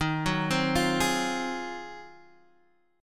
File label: Ab 13th Eb